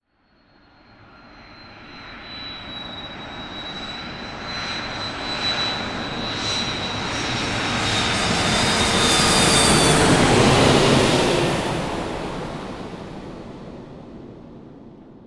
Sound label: large airliner